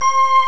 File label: d50 fantasia; Amiga Soundtracker Sample Packs